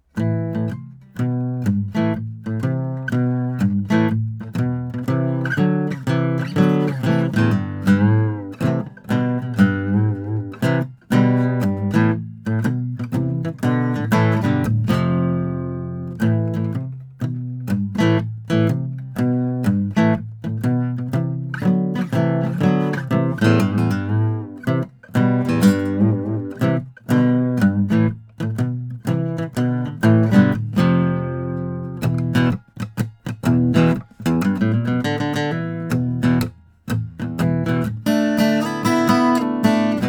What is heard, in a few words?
Dog Walk, Gretch